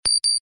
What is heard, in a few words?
Notifier Electro Cricket; V710 Ringer